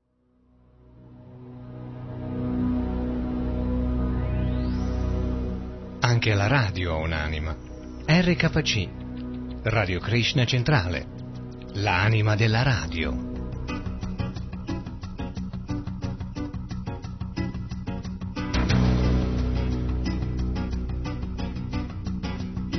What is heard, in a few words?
Jingles; L'anima della radio; RKC; Italiano